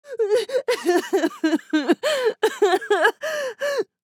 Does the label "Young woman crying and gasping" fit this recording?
Yes